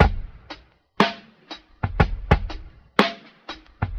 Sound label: Drum loops, Boombap